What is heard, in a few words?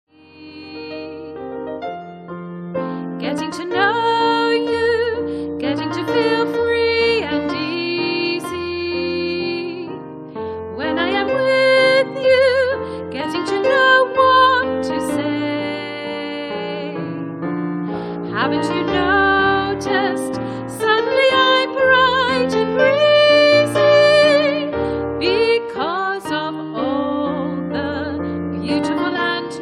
warm up songs